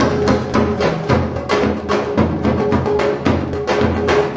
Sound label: 110 japanese drum party; 101 to 110 bpm